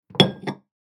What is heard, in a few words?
Stacking glassware mugs; household